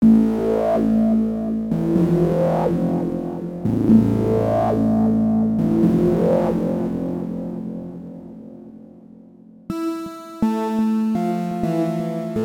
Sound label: obxd; sascha kujawa